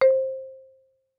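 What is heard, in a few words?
Marimba rubber fortissimo C5; MIS Pitches - 2014; Percussion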